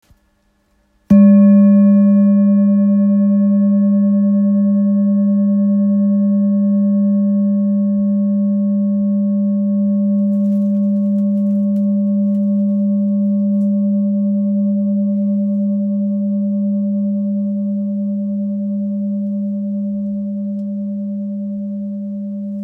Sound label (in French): Bol thick do diese 1.76kg